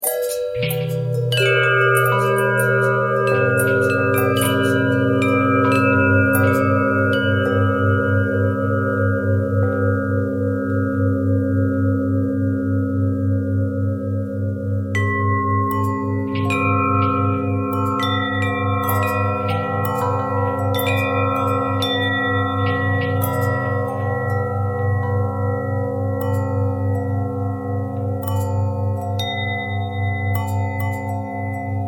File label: bell long bell harmonic twist